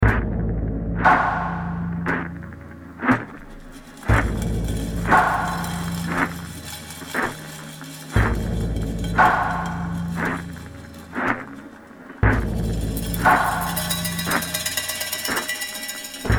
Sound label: rythmic